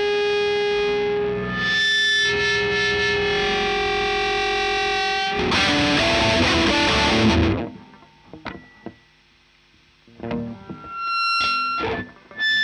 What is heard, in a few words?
Marshal Gtr Line